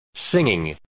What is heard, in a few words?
singing